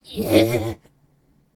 Banshee Death Vocals

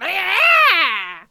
Attack Vocals

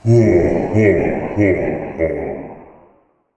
Reaper Attack Vocals

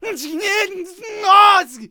Samurai Attack Vocals

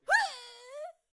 Hay Baler Death Vocals

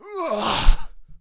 Attack Vocals; Hoplite

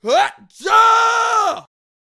Super Boxer Attack Vocals

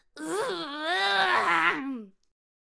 Swordcaster Attack Vocals

